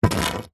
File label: foley wood pole stick scrape drag short on concrete; zapsplat